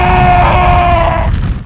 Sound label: burn